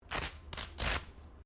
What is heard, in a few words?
Clothing Velcro Rip Sequence; Movemement sounds for various articles of clothing; Ripping; Objects